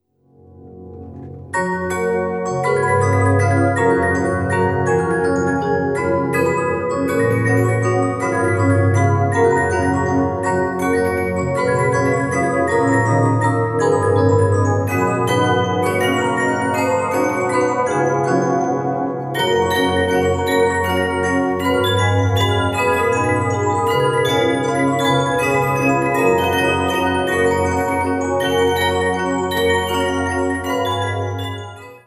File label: Emperor Waltz